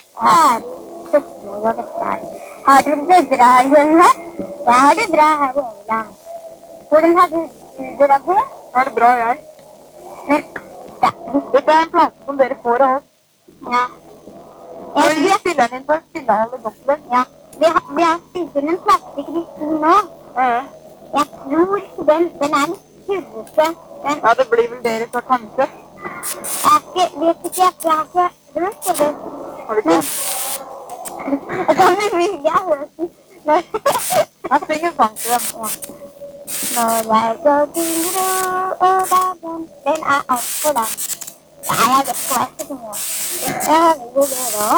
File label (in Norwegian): Gammel plate fra England